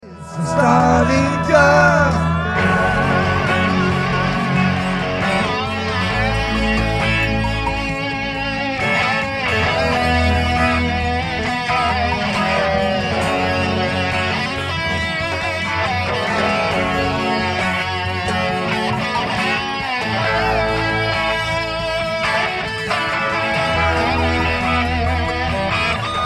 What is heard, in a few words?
solo isolated; Nassau 1988